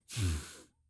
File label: Male Reaction Mmm